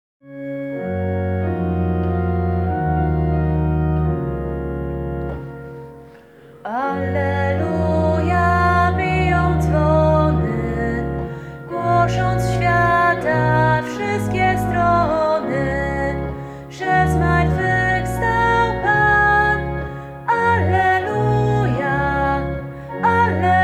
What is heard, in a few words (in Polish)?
Alleluja, bij dzwony; Wielkanoc